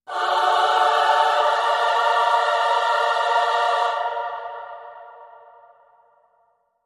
Choir Only

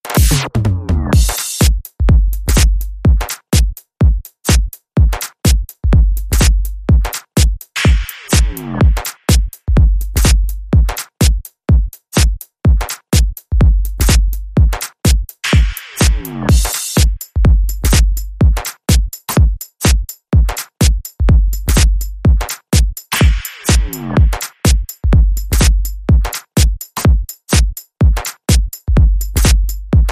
Beatbox Beat

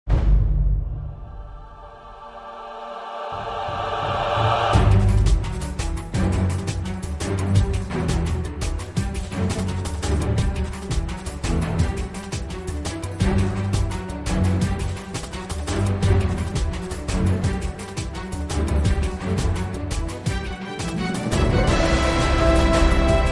Dramatic Chase Edit

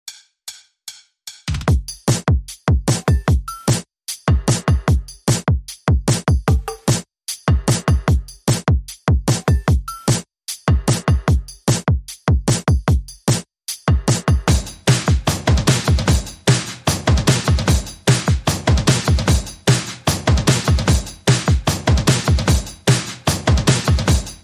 Drums Only

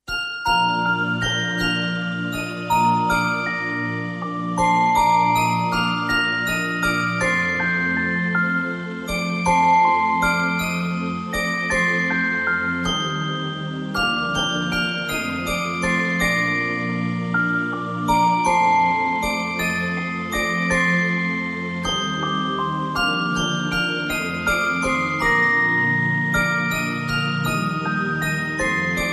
Tu Scendi Dalle Stelle, Carillon Theme Only

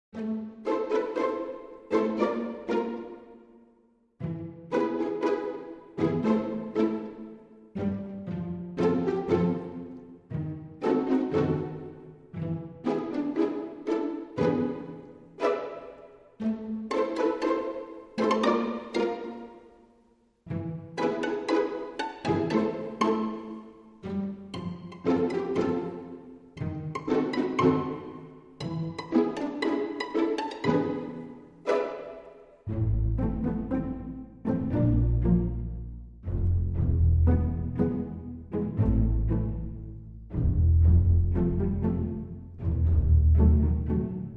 Strings

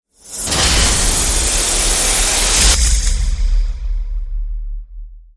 Rise Short Upsurge

